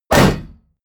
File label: hit shield metal